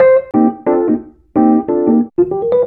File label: ACID JAZZ; Best Service; Advanced Media Trax Modern Composer